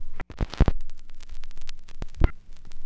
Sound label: Record Set Down; Sonic Foundry (Sony Creative Software); Hydroponic Hip-Hop